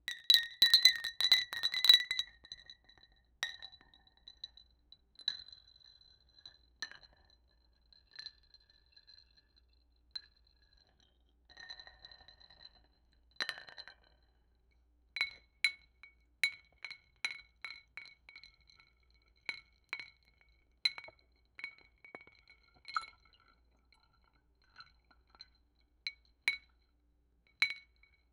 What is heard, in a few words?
ice cubes gently hitting, bar